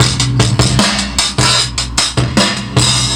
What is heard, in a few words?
Old Skool 76 bpm, breaks; Zero-G Beneath the Planet of the Breaks